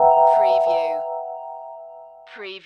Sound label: Hip Hop Dark Rhodes Licks 90 BPM Straight - E